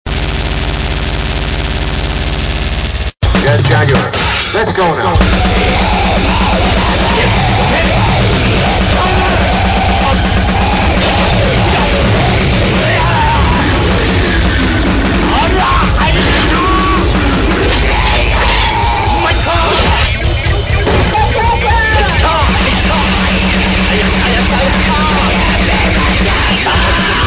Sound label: drum machine gun